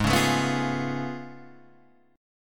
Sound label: A Augmented Major 9th G sharp